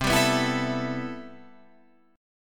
C Major 13th C, Guitar Open F; CM13 strummed

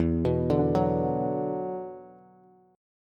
E 7th Suspended 2nd, down, Bass; E7sus2; arpeggiated

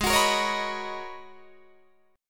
Ab Minor 11th, Ab, Guitar, DADGAD

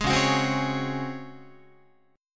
Db Major 7th Suspended 4th, Guitar; strummed

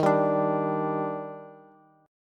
Eb Major 7th, Bass; EbM7; strummed; JGuitar

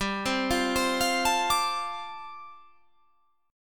G 9th Suspended 4th

